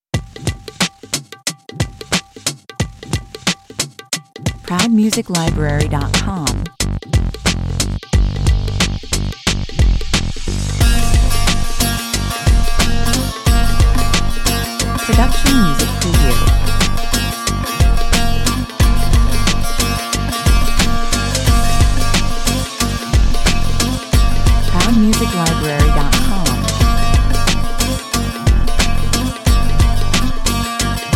guitarra arabica 90 bpm

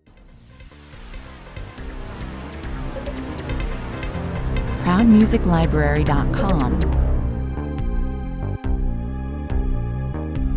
cyborg fugitive anxiety 140 bpm

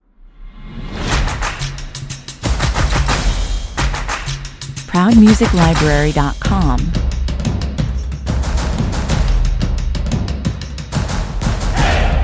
clapping and stomping percussive 180bpm

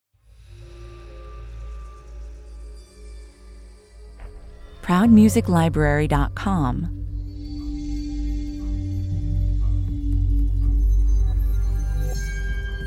ambient brooding 118 bpm

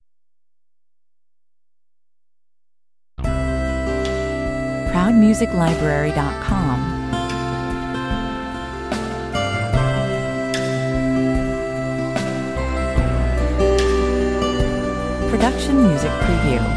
nice sax, Synth sax in a nice intro tune

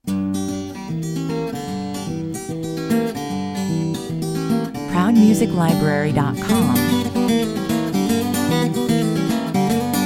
rag, 150 bpm

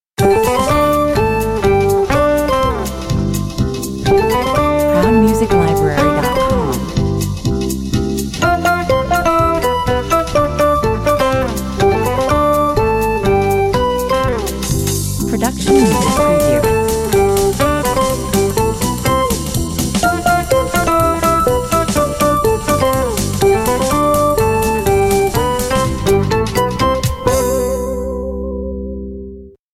piccolo swing, 71 bpm

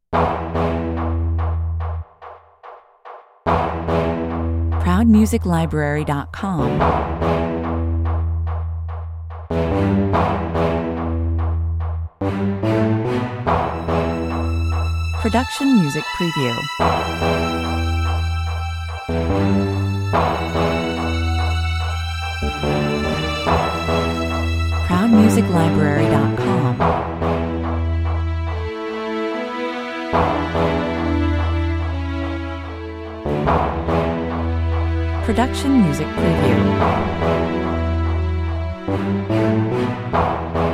awaiting the attack (no drums) 72 bpm

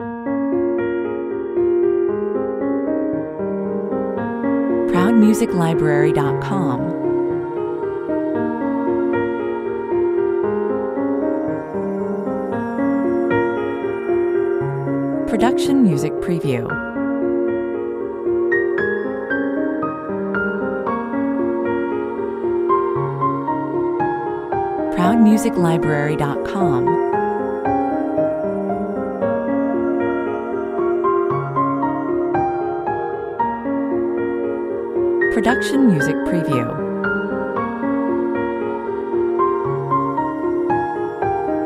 piano rain 115 bpm

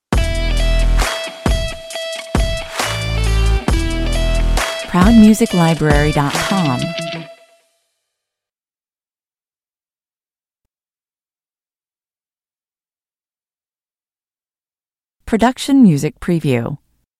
stinger

